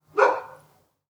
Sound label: Barking Dog; SES Animals SFX